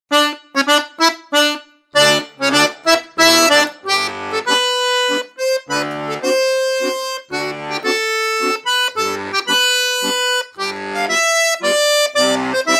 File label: Mazurka, bayan